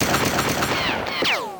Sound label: Gun Shot